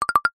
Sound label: cartoon blink flutter shake